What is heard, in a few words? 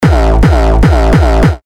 Kick A; Excadrill; Revealed Terra Kicks